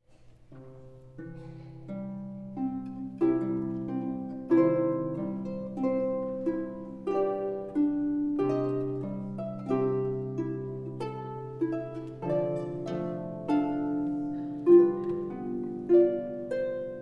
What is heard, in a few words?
harps